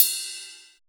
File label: LITE RIDE BEL; R+B KITS2